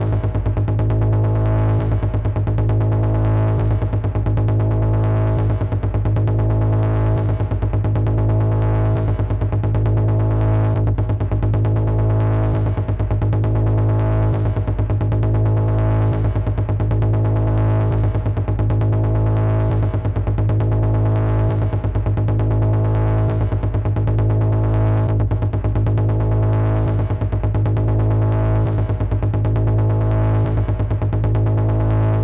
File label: High Heat Modular G 134bpm Loop